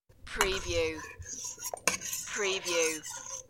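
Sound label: Metal Spoon Pot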